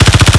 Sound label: Drone Vulcan Attacking; blue archive